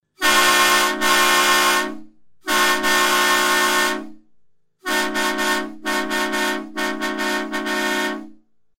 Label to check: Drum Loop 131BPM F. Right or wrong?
Wrong